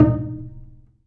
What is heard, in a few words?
Bass Piz G Eb3; Digital Sound Factory Virtuoso